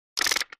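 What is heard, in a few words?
Mechanical Gear Ratchet; machines winches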